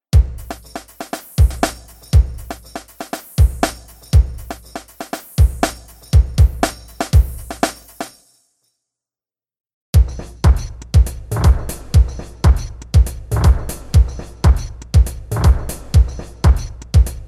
randomizing kits

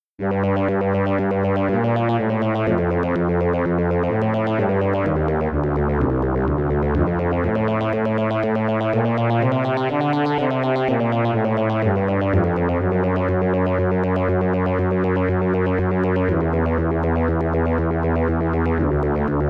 multi shape seq alieno fast

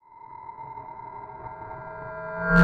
8-bit; free art; holy horror

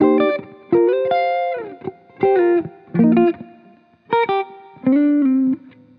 bucket brigade delay dry

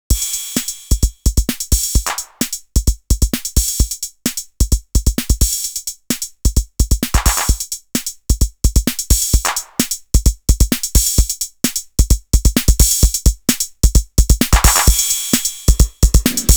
drums; retrox

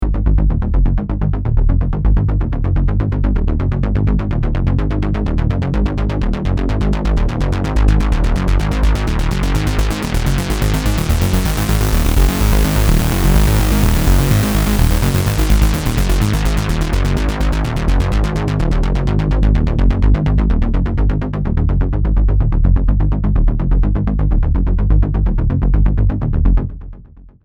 drive bass

